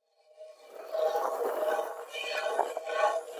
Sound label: defeat spin; smite; kuzenbo